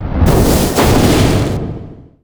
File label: Arcane Orb; Dota 2